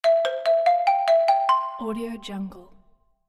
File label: ringtone